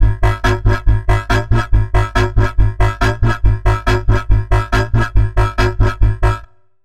Bassline C3 140, Dubstep; GhostHack

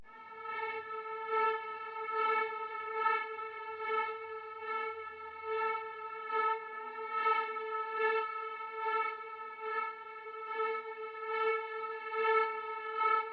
144 BPM A Min String; Trap; Melodies; Loop Stems; Cymatics; Trap Starter Pack; Betrayed

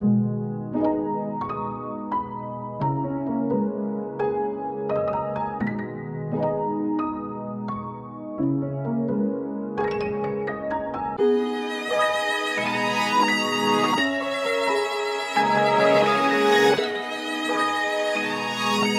86 BPM G# Min, Melody; Cymatics; 2022 Melody Collection

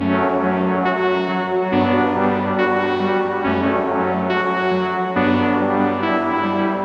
140 BPM F# Min Prophet 6 Keys; Cymatics; Analog; Jupiter; OB6+P6

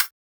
Closed Hat; Ghosthack Advent Calendar 2017 Day 5

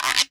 Guiro, World Percussion; Cymatics; PHARAOH Beta Pack; Pharaoh Premium Drum Samples (BETA)

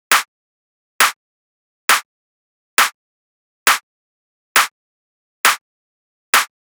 Clap Loop 135, Techno

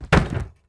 BF Comp Wood; Fairlight Sound Lib

